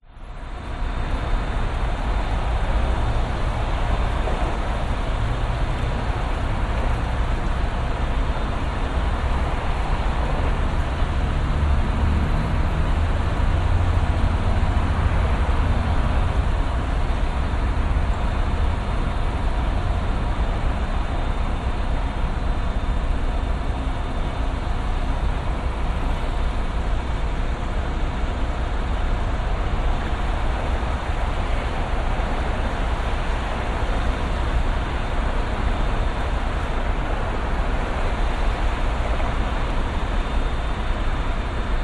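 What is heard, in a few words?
vehicles traffic busy city distant siren brisbane; north quay; zapsplat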